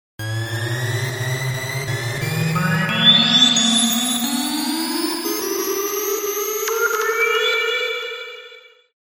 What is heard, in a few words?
SFX Ascending Brain; Synthractive; syntheway